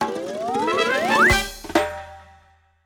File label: Result [Win] [Completed Fanfare]; Animal Crossing New Horizon